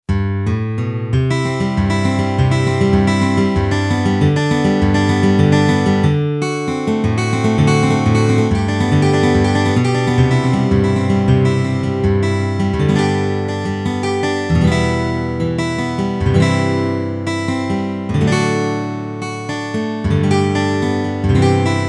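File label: guitar acoustic; E-mu Proteus FX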